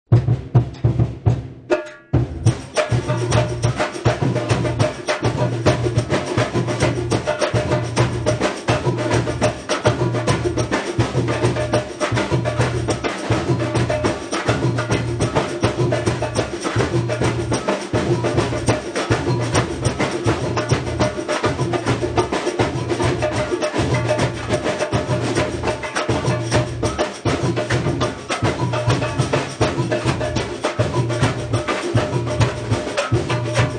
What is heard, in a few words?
Batucada trad